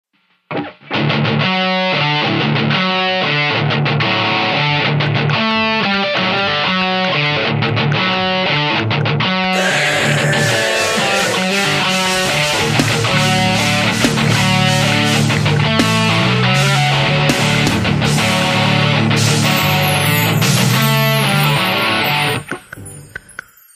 Super Mega Thunder Heavy Metallic Gothic Punk Rock